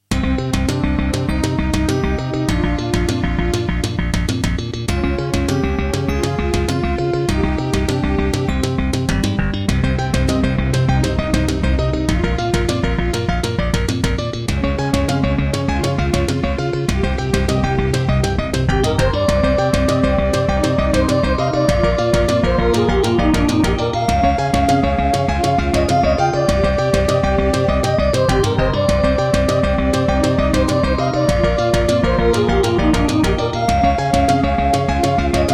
NEC XR385 (DB60XG Clone) GS MODE; Hocus Pocus

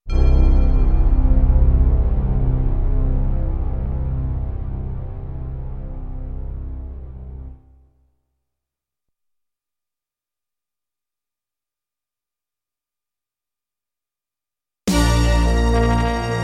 Intro, BCM DB2133a; Dark Forces

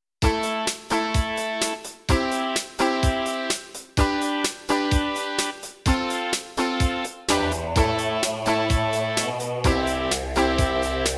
Roland SD-90 GM2MODE; raptor call of the shadows

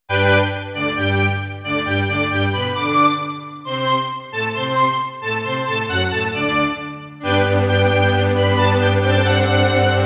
Roland Edirol SD-20 GS MODE; classical; Mozart; Eine Kleine Nachtmusik partial

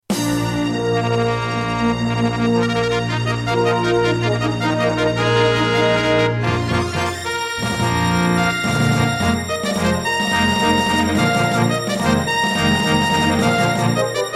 Intro, Yamaha MU2000EX GS MODE; Dark Forces

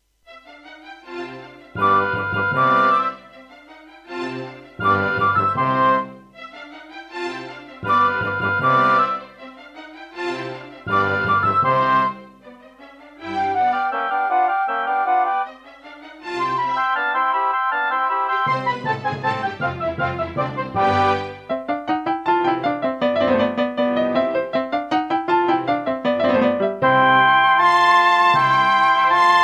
Mozart Concerto K467 partial, Serdaco MP32L; classical; GeneralUser GS Bank

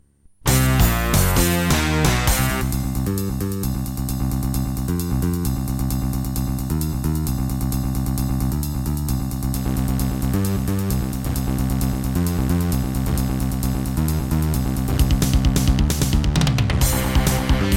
Serdaco E-Wave; sigil doom episode 5

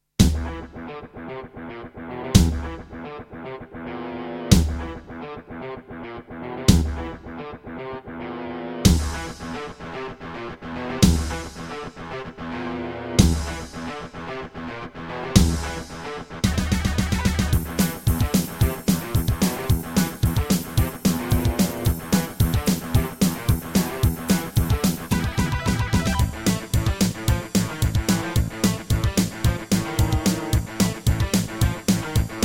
Midiplus MiniEngine USB; Doom - E1M1

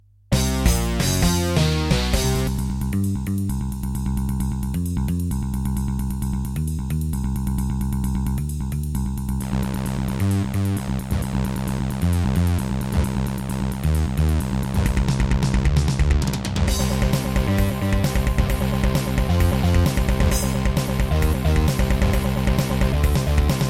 Yamaha DB50XG; sigil doom episode 5

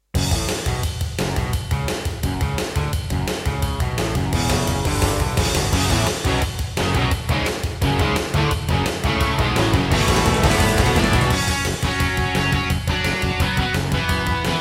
Intro, Roland SC-88 Pro; Duke Nukem 3D

